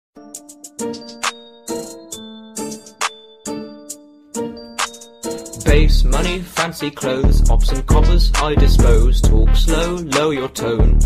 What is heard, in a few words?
British Rap; Meme